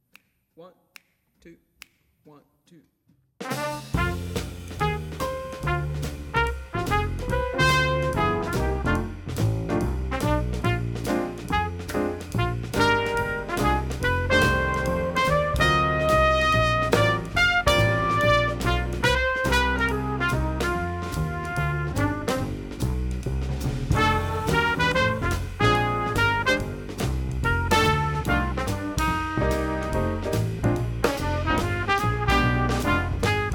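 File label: Jazz Trumpet; 2018 All State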